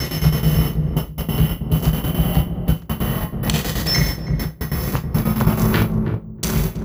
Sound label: Envelope Beat; Drums & Drones